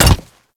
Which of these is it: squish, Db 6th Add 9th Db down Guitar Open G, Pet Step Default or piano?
Pet Step Default